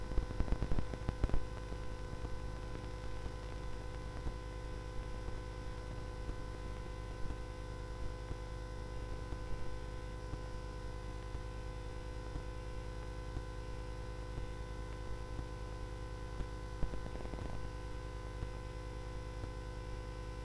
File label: Musicbox, sid CSG8580R5